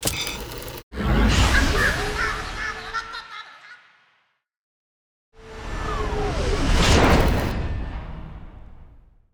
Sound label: Door Lab Open; Rabbids Coding